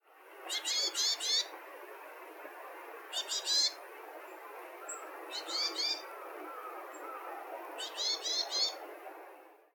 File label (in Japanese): yamagara; hayakawa